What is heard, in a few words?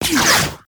Flak Cannon Alt Fire Pass By